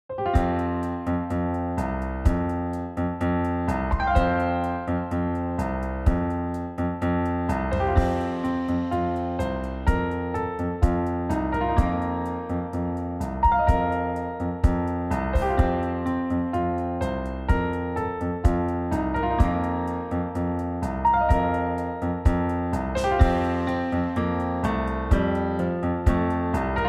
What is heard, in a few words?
Celebration Bells Playback